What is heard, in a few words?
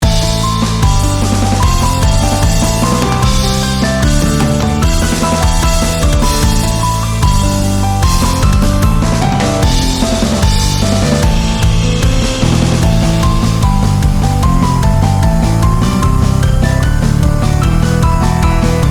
battle